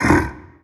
death spawn vox

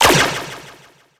tear death small; steven

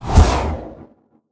portal emerge

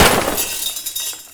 lock break

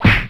punch

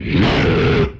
monster roar

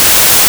static buildup; dogma

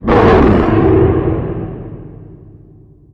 stomp; satan

